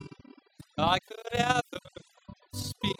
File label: hat